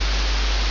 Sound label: ambience room tone